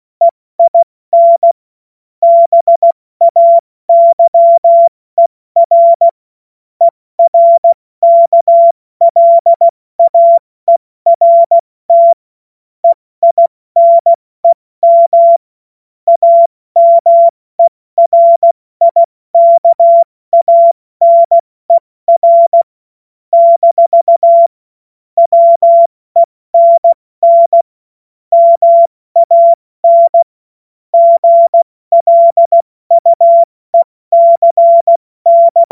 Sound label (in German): Witz, cw morsen; Tempo 70 F80